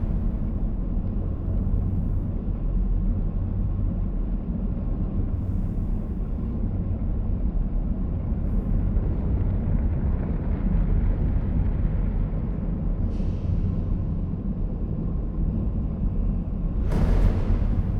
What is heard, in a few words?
ship mining station idle; aquatics